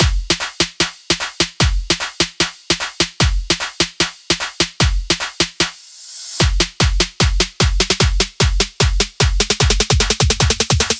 Dubstep Buildup Drums - 150 BPM; Loops; Cymatics; Dubstep Starter Pack

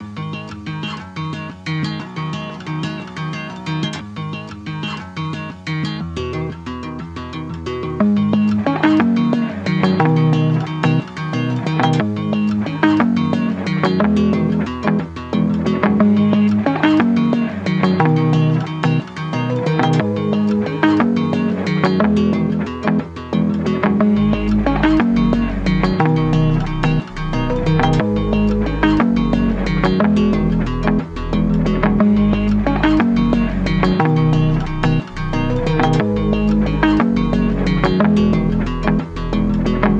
120 BPM G# Min, Guitar; Mask; Cymatics; Miami Guitar Pack - Pt 2

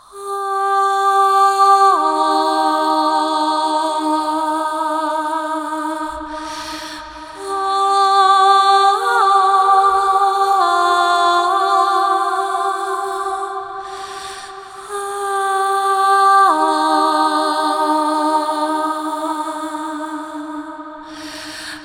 Middle 8 Vocal WET; Construction Kits; VOCALS; Cm 65Bpm; Ghosthack; SkyRealm; Kit 02 - Solomon Hill

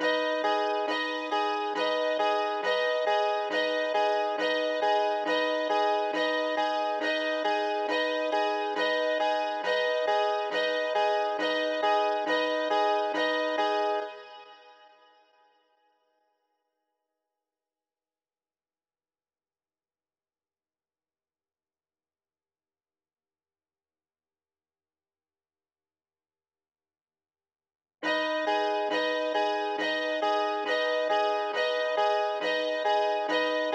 137 BPM G# Min Piano Main; Cymatics; Black Benz; 2022 Melody Collection Platinum Expansion

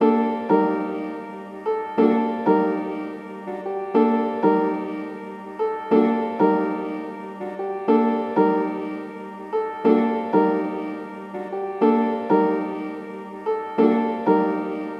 122 BPM G Min Piano, melody loop stem; Trap; Loop Stems; Cymatics; 2022 Melody Collection - Trap Pt 2; Late At Night

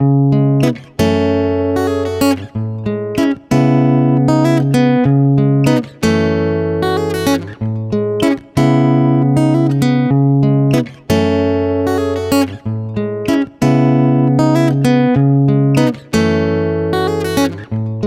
Guitar 95 BPM (B#min); Guitar Loops; Angel; Ghosthack; Guitar Loops & Shots

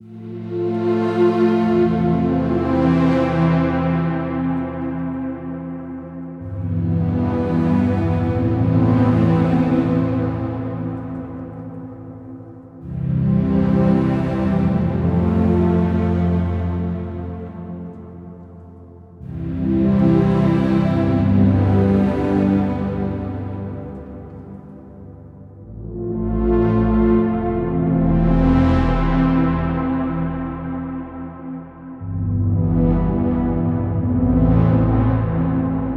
75 BPM A Min, Ambient; Melody Loops; Awakening; Cymatics; ANALOG EVOLUTION Beta; Ambient Collection

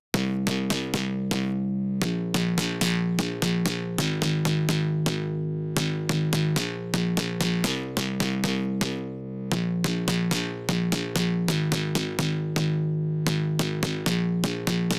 128 BPM C Min Bass; House; Drop Loops; Cymatics; Golden Hour

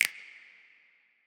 Stacked Snap; Cymatics; PHARAOH Beta Pack; Pharaoh Premium Drum Samples (BETA)

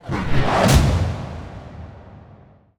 Whoosh Hit; Whooshes; Ghosthack; Aurora

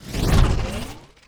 wooshes transform sweetener

